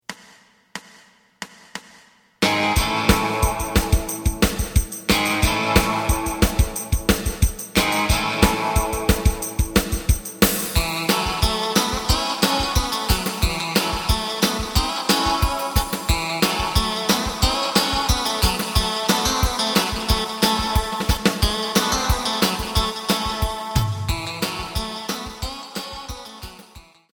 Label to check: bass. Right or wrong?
Right